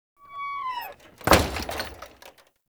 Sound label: Tailor Shop Door Close; Warframe; Ambience; Nineteen Ninety Nine